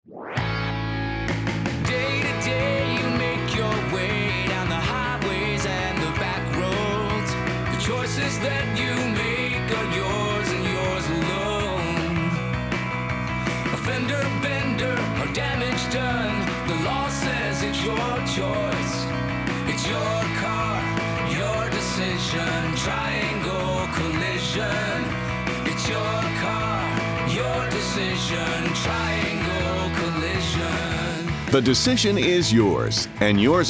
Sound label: Sing